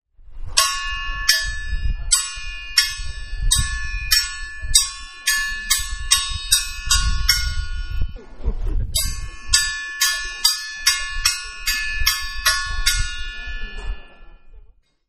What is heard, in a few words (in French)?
cloche de l'école; voyage au burkina janvier 2016